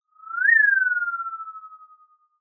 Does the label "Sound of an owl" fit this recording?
Yes